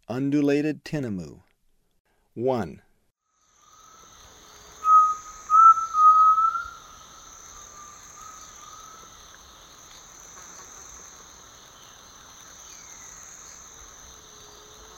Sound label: Undulated Tinamou; S. America; Eastern Lowlands